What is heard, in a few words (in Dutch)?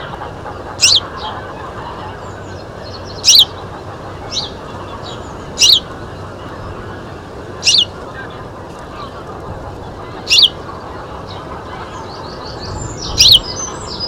Huismus Passer domesticus domesticus